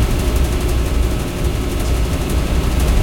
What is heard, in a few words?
Fan Medium Noise LOOP